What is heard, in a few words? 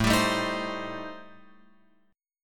A-Minor 7th Flat 5th, Guitar Open F, chord; Am7b5; strummed